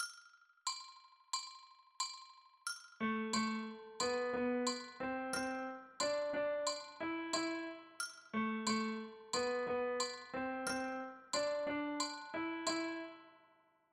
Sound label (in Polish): To samo przesunięte o ósemkę, Z podkładem